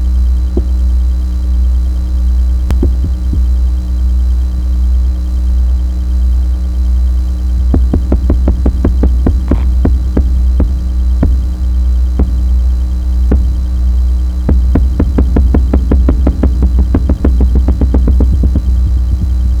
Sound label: melanogrammus aeglefinus, soniferous fish; Narragansett, RI in the early 1970's; Drumming sounds; recording made by Tom Halavik, NMFS/NEFSC